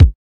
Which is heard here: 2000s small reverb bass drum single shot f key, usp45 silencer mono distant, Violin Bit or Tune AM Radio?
2000s small reverb bass drum single shot f key